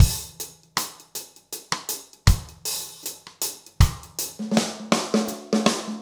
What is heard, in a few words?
reggae 80